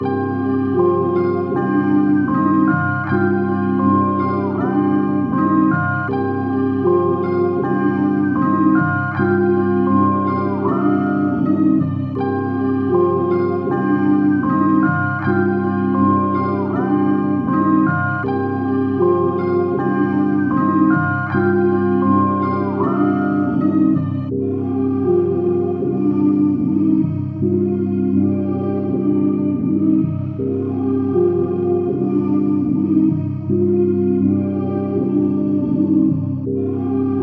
158 BPM C Min, Trap Melody Loops; Street Poetry; Cymatics; Nightmares Trap Melody Loops

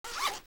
Bag Unzipped; Fairlight Sound Lib

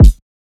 Kick, Modern Drum Kit; Hawaii; Cymatics; ANALOG EVOLUTION Beta

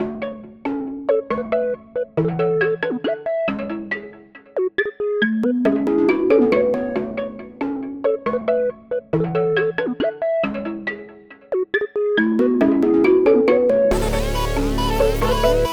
138 BPM F# Min, Virtual; Astra; Cymatics; Destiny Premium Melodies (BETA)

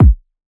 kick A#, drum one shots; Cymatics; Cobra Hip Hop Sample Pack

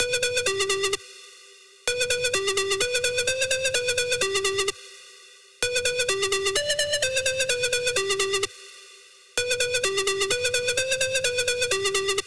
128 BPM E Min Lead; House; Drop Loops; Cymatics; Goliath

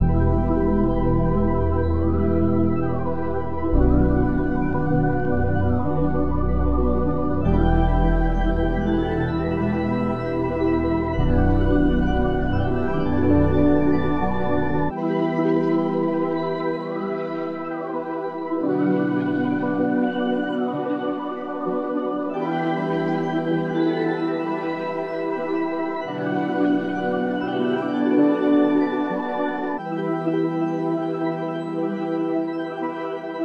129 BPM E Min; Cymatics; Zodiac III Beta Pack; Zodiac III Production Suite

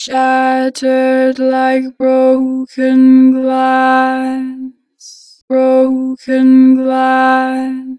Shattered, Harmor Vocals; 4 bar flat; Pitch Flat

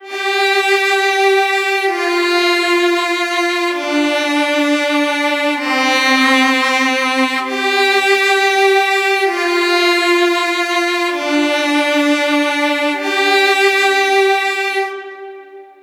Cello - Dm 130Bpm; Construction Kits; STEMS; Ghosthack; SkyRealm; Kit 24 - Dragon Mountain

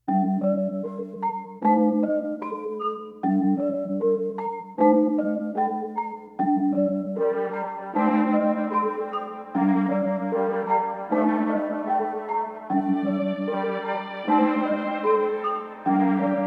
Dark Melody Loop - 152 BPM D Min; Cymatics; 2020 Melody Collection

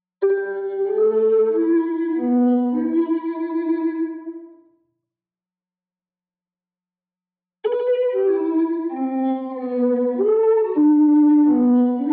97 BPM E Min Romantic Violin; Melodies; Loop Stems; Cymatics; 2022 Melody Mystery Expansion; Sleepless Night

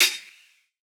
Hihat, Dubstep; Ghosthack

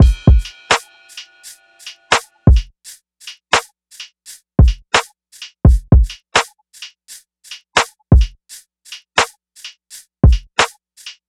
Diffuse Drum Loop 85 BPM, Lofi; Cymatics; ANALOG EVOLUTION Beta

